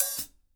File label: subtle reverb open hi hat one shot g key; Duration 0:01; Samplerate 44100 Hz